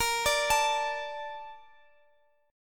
A sharp Major 7th, Guitar Open F; arpeggiated

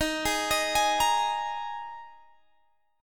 Eb Suspended 4th Eb Guitar Standard; Ebsus4 arpeggiated; JGuitar

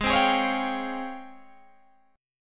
G sharp Major 13th, Guitar, Standard; G#M13 strummed

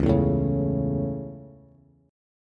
Db Minor Sharp 5th, Bass; strummed; 9,7,7,6

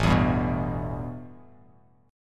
A 7th Suspended 2nd Bass Standard; A7sus2 strummed; JGuitar

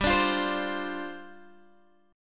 G 7th Suspended 4th G down Guitar Open G

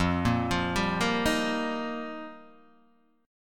F 7th Suspended 2nd Suspended 4th F Guitar Open D

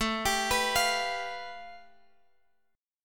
A 7th Suspended 2nd Sharp 5th, Guitar, DADGAD; 19,22,21,22,x,x

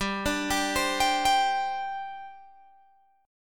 G Suspended 4th, Guitar, Open G

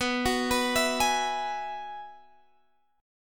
Ab Minor 7th Sharp 5th

